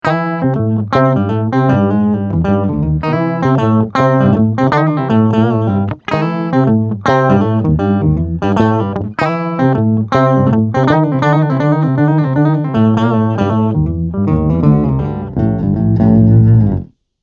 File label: PITCH BOX